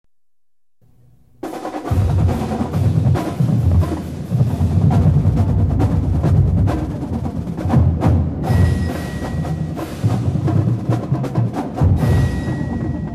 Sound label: Percussion Solo